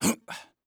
jmp

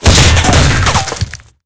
tank hit he small; tanks; War Thunder

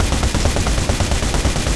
loop flak38, guns tanks

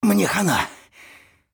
hurt near death; Escape from Tarkov; scav1

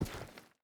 footstep rubber boots stones walk; Rust

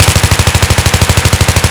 Machine Gun Fire 5 56; Weapons Automatic; DCS

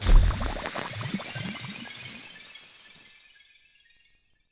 Chemical Poof; Civ4 warlords

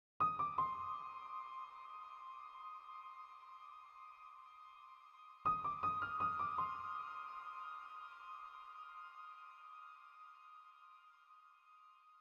music interactive; World of Tanks

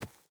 footstep barefoot dirt run; Rust

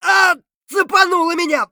hurt light; Escape from Tarkov; scav3

